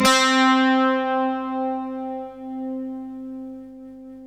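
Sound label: GUI, Slow Strings; Optical Media International - Sonic Images Library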